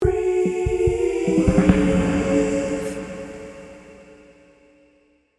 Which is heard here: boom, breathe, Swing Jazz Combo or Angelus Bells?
breathe